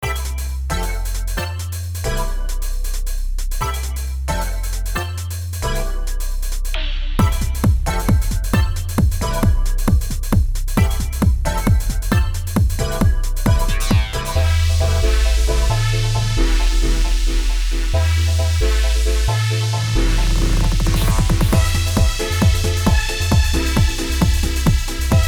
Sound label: fantom x electro house